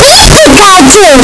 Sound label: pikachu cry; pokeyellow